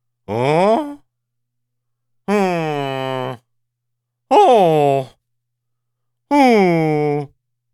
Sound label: Short Male Disappointed Or Puzzled